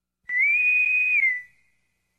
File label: Boatswain Whistle